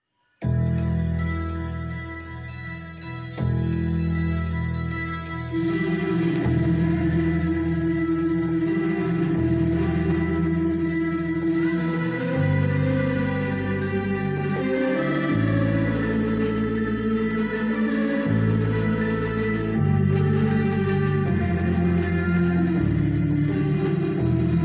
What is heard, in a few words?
song intro music played on roland xps 10 keyboard